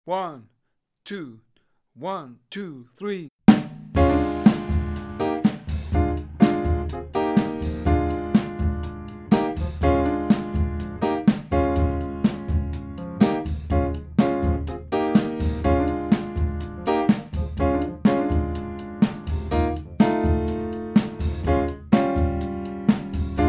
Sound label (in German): blues in b dur jazz rock play along